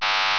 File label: LASER; 激光